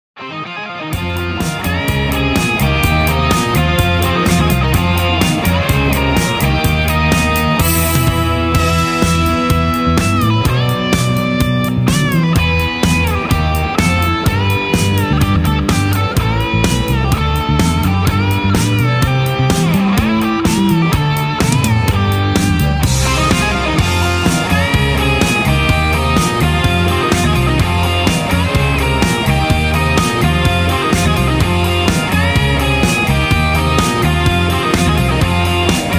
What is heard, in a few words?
no melody